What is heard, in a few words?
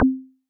wood drum